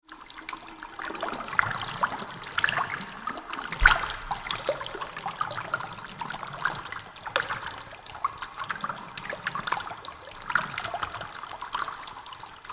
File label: Water In A Cavern; nature; fantasy sound effect; Andrew Fleming